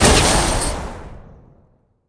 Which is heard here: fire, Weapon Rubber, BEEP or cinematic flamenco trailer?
fire